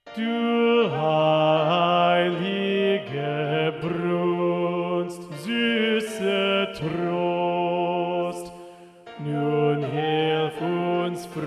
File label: motet bass